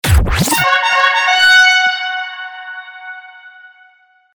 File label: Ticket Fanfare